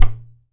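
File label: IPU GOURD, HAWAII PERC; AKAI S6000 CD-ROM; WorldSounds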